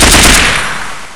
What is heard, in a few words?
m16 burst; weapons